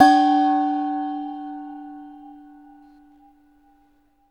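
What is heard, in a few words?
ART SHELL, percussion metallic; Rarefaction; Poke in The Ear With A Sharp Stick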